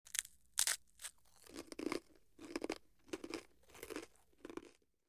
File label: Bite Chew Cracker